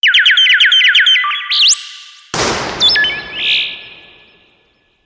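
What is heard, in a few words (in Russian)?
penie pticy, birdsong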